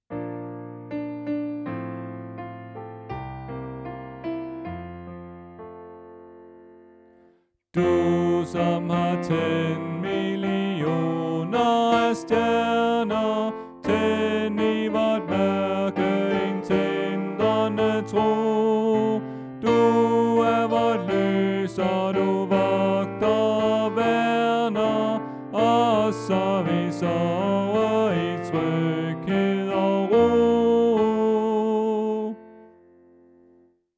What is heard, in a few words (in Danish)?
Tenor